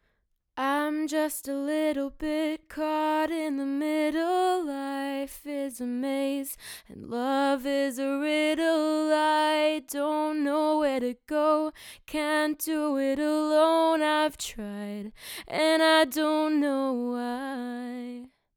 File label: AKG P420 singing sample